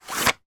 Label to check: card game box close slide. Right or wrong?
Right